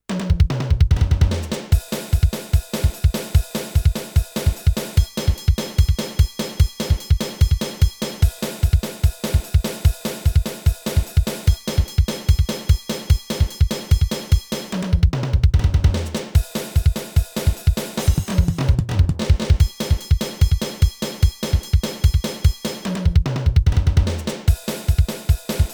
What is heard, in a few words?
Roland TR 626 Preset Pattern